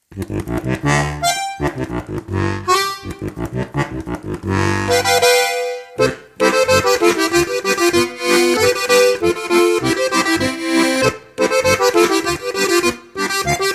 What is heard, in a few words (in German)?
harmonisch diatonisch